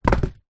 household pool noodle float tube down on hard ground